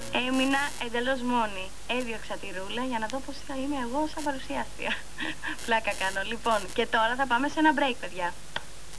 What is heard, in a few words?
break